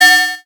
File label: Multimedia Internet CD-Rom Flash Beep Mechanical